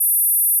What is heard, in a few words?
Gauss Filt A5